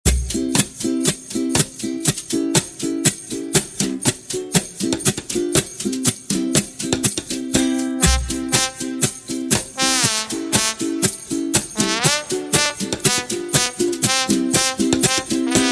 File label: Jingle